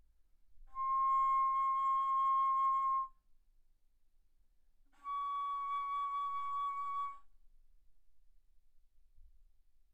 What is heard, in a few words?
Bass Flute C6 Db6; ff